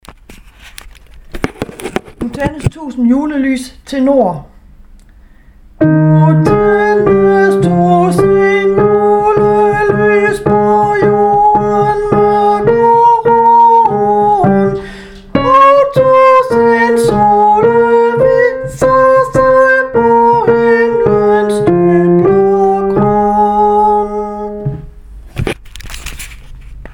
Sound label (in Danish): tenor; nu tændes